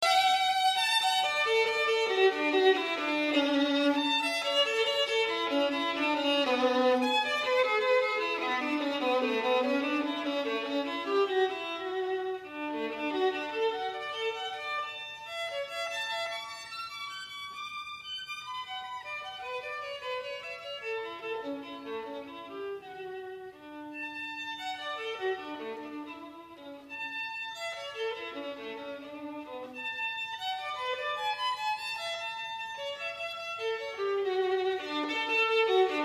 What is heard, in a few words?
violin